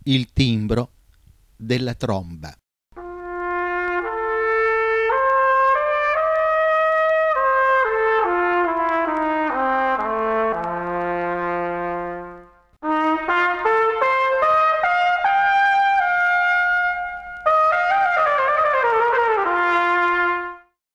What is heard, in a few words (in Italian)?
Tromba, il timbro della tromba